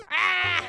dying noise; noises; Mario

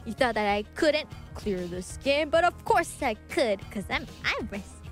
victory theme, Singing; VGamer

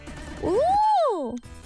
wooooh

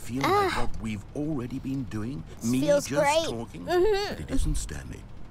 stretching

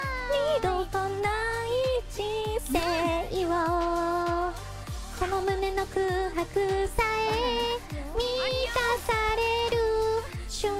Singing; IRyS

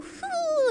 Fuuuuu

